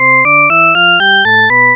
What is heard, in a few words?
scale pure, shepard tone